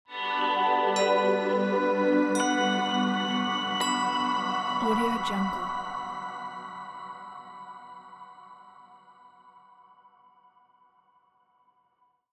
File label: atmospheric logo; musicante